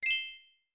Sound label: notification; samsung Aleart